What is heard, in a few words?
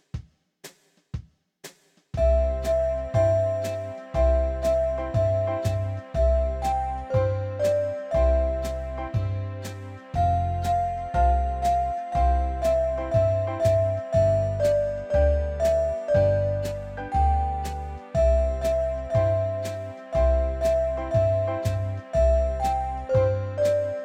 Jingle Bells, keyboard